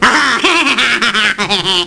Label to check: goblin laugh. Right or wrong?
Right